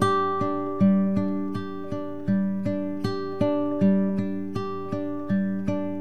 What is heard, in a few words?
arpeggio